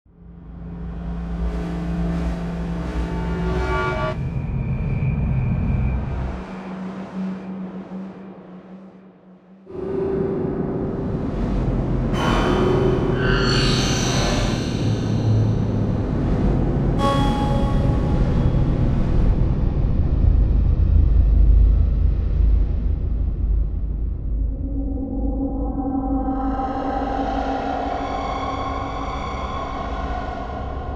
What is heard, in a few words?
Electronic Whoosh Reverse Feedback Digital